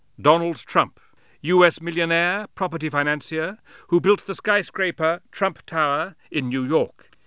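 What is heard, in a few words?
trumpet; 20th Century Newsmakers; Scarlet Software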